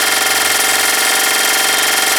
Emplacement Move Gun Loop; Grineer; Weapons

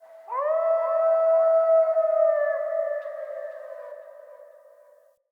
Wolf Cub Landscape Howl; Orengal

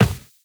Run Default, Footsteps; Warframe; Tenno

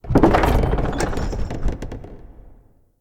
Winch Start; UnderCity

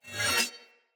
Dagger Heavy Whoosh Wind Up Accent Long; Weapons; Player; Swords; Fey

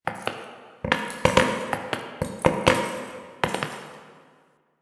Idle Big Jumps; Ambience; Warframe; Entrati; Tagfer

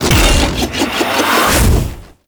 Wolf Spin Attack Charge; Grineer Wolf

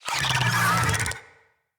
Infested Big Ashen Vocal Attack Death

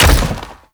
Step Default, Foley; Warframe; Sentients; Erra

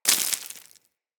Bullet Impact Debris Dirt; Weapons

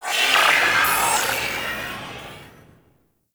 Ship Mechanical Textures

